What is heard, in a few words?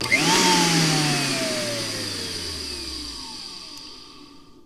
BLENDER OF, Kitchen Counter; E-MU Producer Series Vol. 2; More Studio Essentials